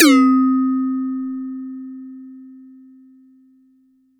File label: Perc